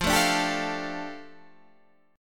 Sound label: F 6th Add 9th F down Guitar DADGAD, chord